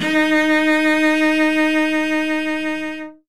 violin; String

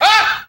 "ah"

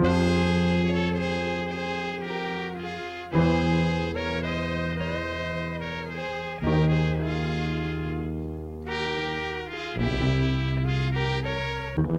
horns, Sad

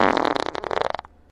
fart medium

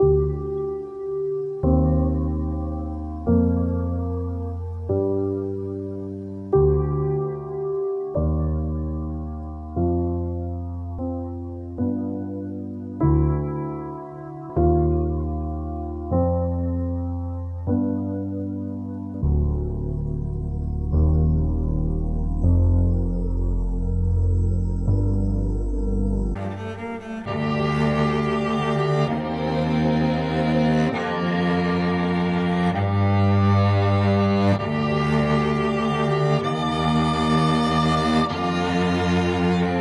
piano frail, sad loop; Loops

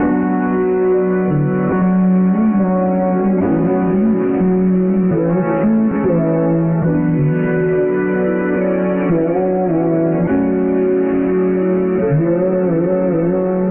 keys muted singing, Sad Loops

